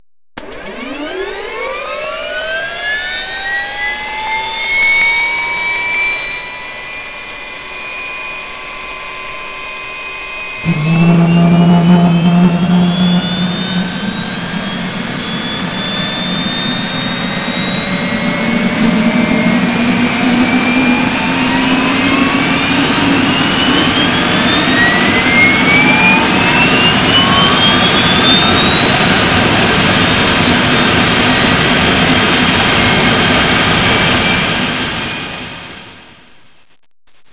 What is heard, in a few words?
HELICOP